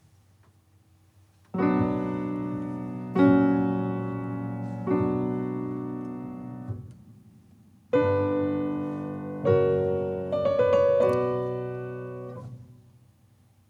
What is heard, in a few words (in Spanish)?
Sonata para trompeta y piano en Re mayor; Henry Purcell; Z.850; mov.II